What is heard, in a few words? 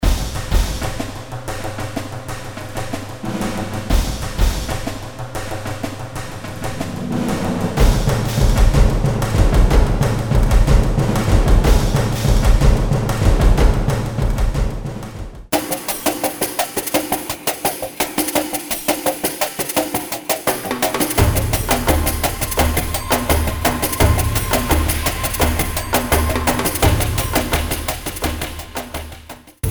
cinema drums